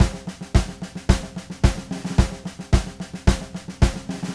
Red Maple Groove 110, DW Red Maple Kit

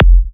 Kicks F; markus hakala

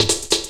LOOP SD; Ueberschall; Drum & Bass Resonance

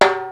drum

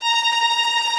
VI TREM F4A-R; AKAI Advance Orchestra Upgrade 97 Vol.1

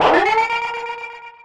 warning, signals; AKAI; FX SIGNALS

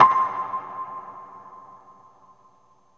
plinck, metal perc; Zero-G Ambient 1

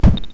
Electron Sidstation, Drum Machines

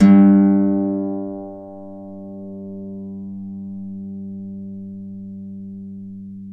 Celtic Harp

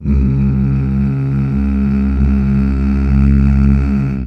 MMHH

